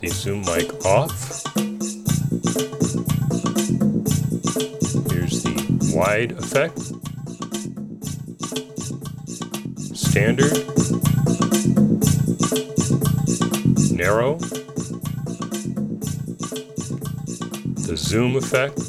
zoom mic music

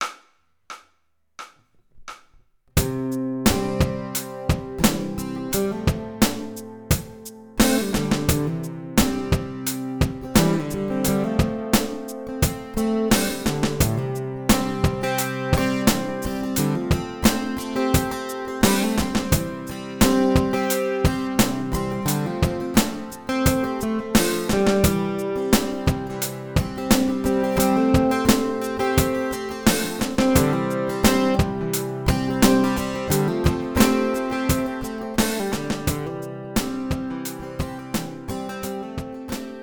drums guitar

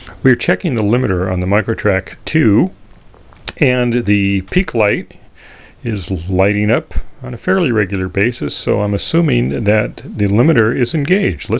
limiter test

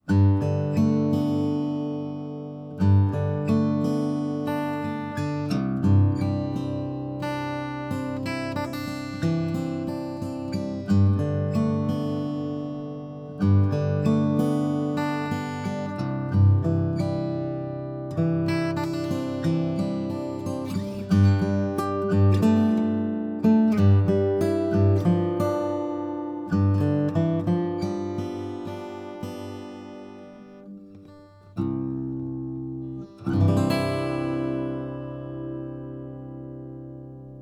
zoom h2 ac guitar

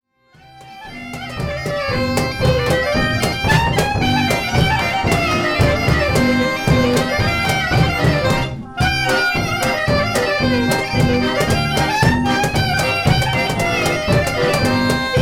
bagpipe band

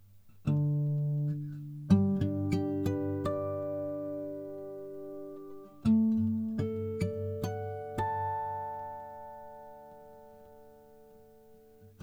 micro gtr

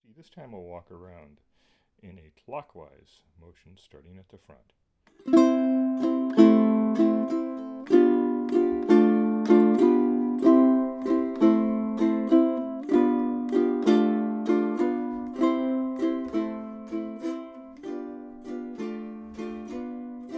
zoom h2 walking uke front

